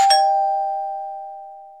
door bell

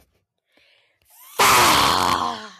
fahhh

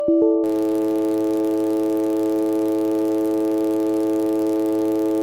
bsod sound; windows 10

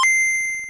game boy startup; nintendo

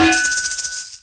metallic bonk and rattle

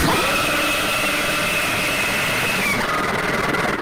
jumpscare scream sound

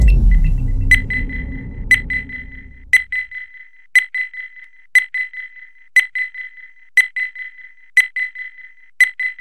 accept; counter-strike

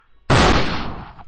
gunshot

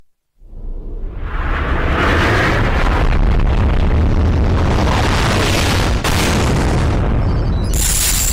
drop pod

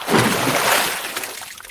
water splash; dr seuss

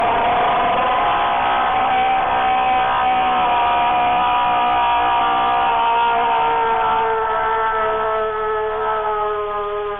scream; fnaf golden freddy

